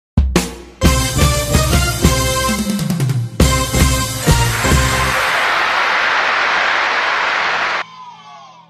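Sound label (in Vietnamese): Nhạc chào mc nhạc chào mc đám cưới mở màn chương trình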